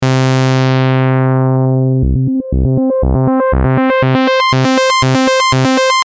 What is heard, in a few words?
saw filter